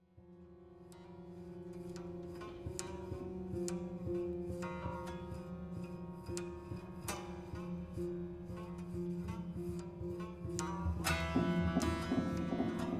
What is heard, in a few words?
Bed of Twang; Waking Mars